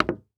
Foley Footstep High Heels Single Step Down On Hollow Wood Surface; Foley Footsteps